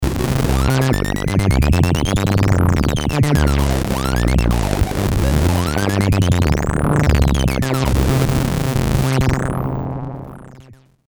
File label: synth, korg ms20